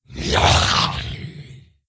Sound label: Attack; General Vezax